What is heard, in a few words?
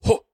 attack; Elder Hu